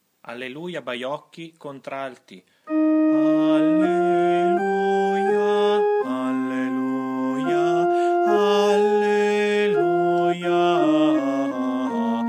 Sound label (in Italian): Contralti